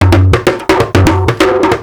funky drum loop 130bpm; Crowdsourced Sample Pack; Drum Kit; Drum Loops

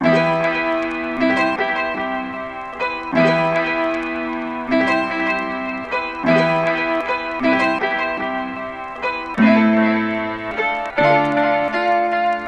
Piano 77BPM Am; PianoDemon01; Melody Loops; TRUTH-HZ; Crowdsourced Sample Pack

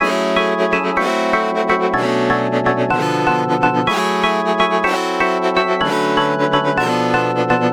gese da o progressions, Instrumental Loops; eskre; TRUTH-HZ; Crowdsourced Sample Pack

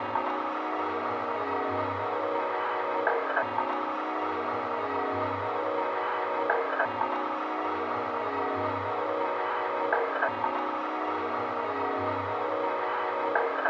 BEAT, Pads; TRUTH-HZ; Crowdsourced Sample Pack

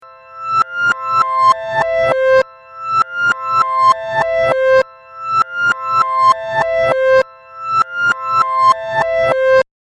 Piano 100bpm; Piano & Keys; Crowdsourced Sample Pack

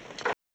PERCUSSION; TRUTH-HZ; Crowdsourced Sample Pack; Drum Kit

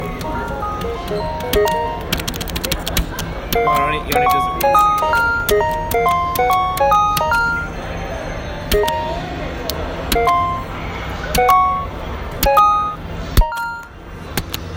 Ebm; Soundscapes; Crowdsourced Sample Pack

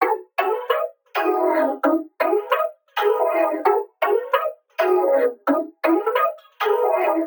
Vocoder Chords [132 BPM]; Melody Loops; Crowdsourced Sample Pack; TRUTH-HZ

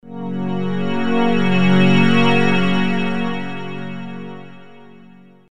Ringtone (Pad); Crowdsourced Sample Pack; Pads

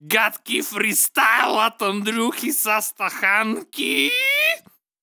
Bad freestyle, Vocals; TRUTH-HZ; Crowdsourced Sample Pack

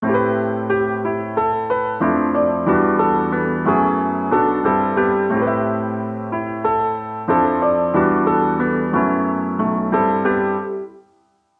Am jazzy, Melody Loops; gese; TRUTH-HZ; Crowdsourced Sample Pack

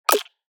Snare; TRUTH-HZ; Crowdsourced Sample Pack; Drum Kit; Claps & Snares; Dropped The Snare

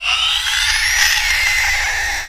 pig being shredded by high intensity lazer; Truth-Hz - 1-Hit KO Sample Pack

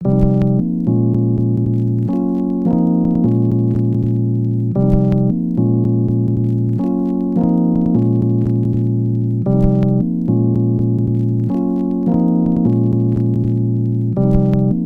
more chords, Piano & Keys; Crowdsourced Sample Pack

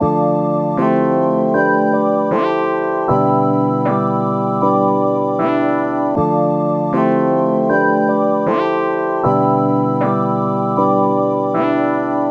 Regret melody; Melody Loops; TRUTH-HZ; Crowdsourced Sample Pack

